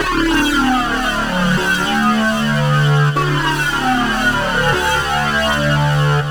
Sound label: synth, Hardcore Breakbeat 152 Dmin; Big Fish Audio; Hardcore Breakbeat Action; Gone Insane